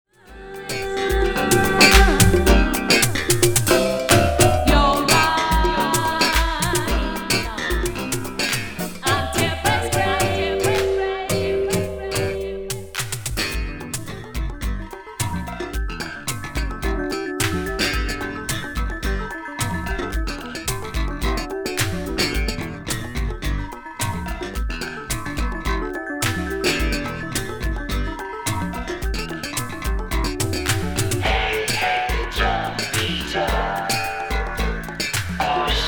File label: bass